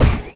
heavy vent, footsteps; player; heavyfeet; Dystopia

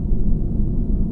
ambient; assemble

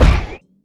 heavy feet, footsteps; player; Dystopia

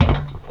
metal grate, footsteps; player; Dystopia

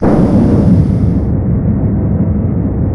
missile idle; Dystopia; silo

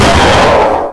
metal break; Dystopia; physics

